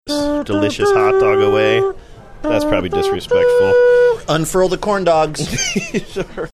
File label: playing taps with mouth; saying unfurl the corndogs